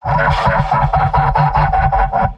pyro m laugh long; tf2; garrysmod; mvm

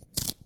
step; brainrot; penshaur

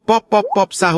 loop; pum sahur; pop pop sahur

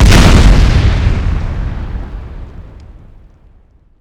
pipe bomb; tf2

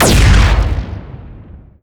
main shot, weapons; cow mangler; tf2

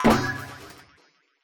Jump; Garry's Mod; boxy boo v2; boxshe boo

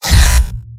pain sharp; heavy; mvm

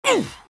soldier pain sharp

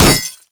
robo impact bullet; physics; Garry's Mod; tf2; mvm

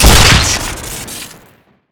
short stop shoot crit; tf2

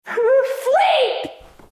sleep; Garry's Mod; popp5 lily love

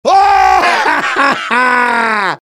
demoman laugh happy; vo; taunts; tf2

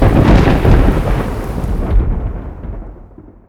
thunder

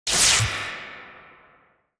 resistance medium; Garry's Mod; tf2

